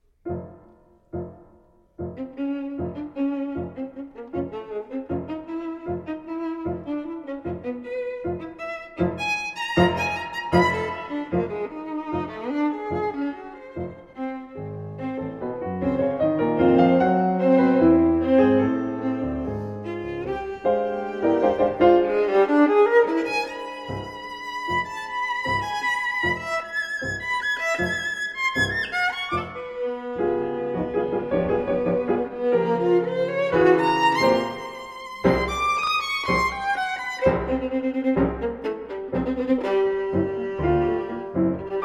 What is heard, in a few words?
sonata for violin and piano allegro e molto ritmico